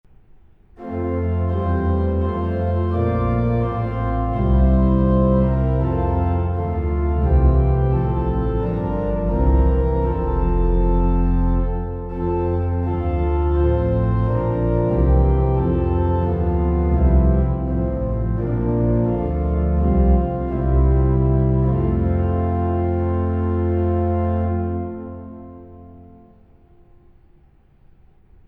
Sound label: organ; praetorius; hymns